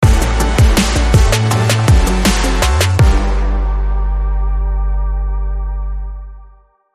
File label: Brass Kicker